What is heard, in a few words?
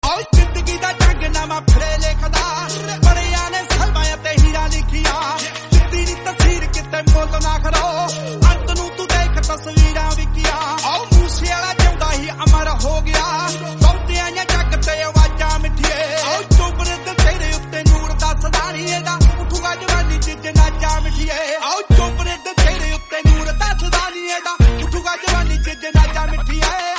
bgm instrumental